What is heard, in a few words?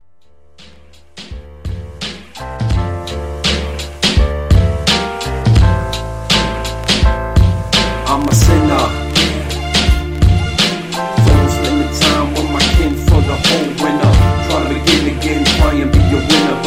solo train